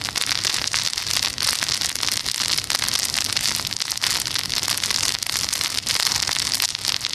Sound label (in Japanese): takibi